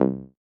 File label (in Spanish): Click Invalido; Do Not Feed the Monkeys